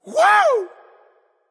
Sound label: "yay"; smite; merlin